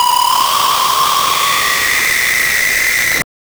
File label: a jumpscare sound; fear